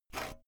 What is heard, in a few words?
saw use short light